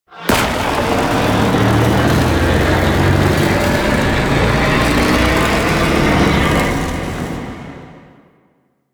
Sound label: Void Dragon Orb Charge; Enemies; Abilities; Warframe; Duviri